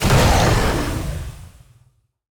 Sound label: Enemy Roar; VO; bloom minigame